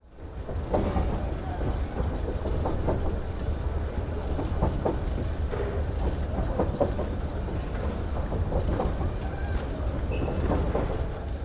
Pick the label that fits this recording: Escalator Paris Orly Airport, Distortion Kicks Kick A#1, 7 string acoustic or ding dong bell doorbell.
Escalator Paris Orly Airport